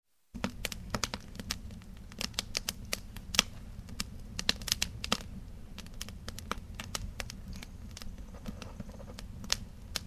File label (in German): feuer; schweden 2000